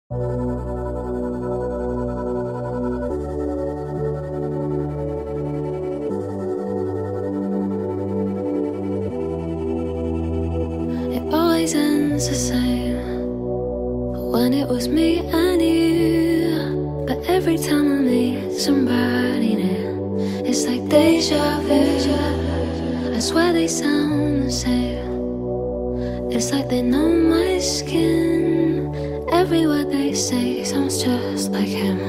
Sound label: sad acoustic version